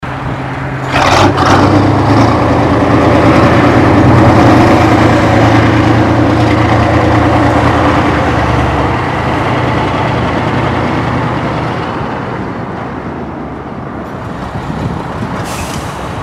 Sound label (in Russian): rabota kirovca k700